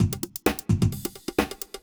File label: electro kit 130